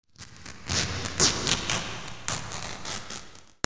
RIPPING

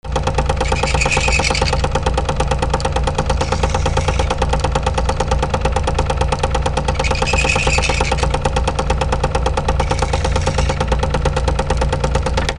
INDUSTRY

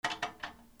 BATHROOM

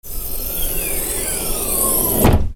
CARS

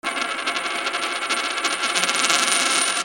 MONEY

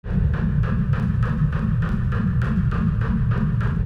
TRAINS

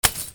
BREAKING

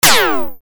WEAPONS

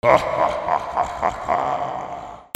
CARTOON

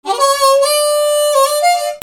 MUSICAL

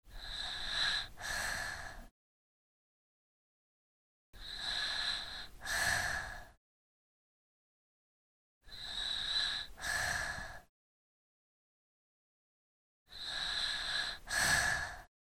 PEOPLE

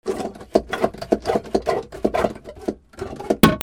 BOATS

